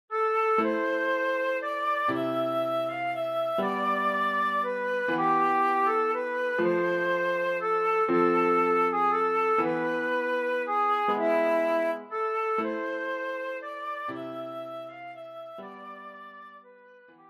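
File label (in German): Querflöte; Greensleeves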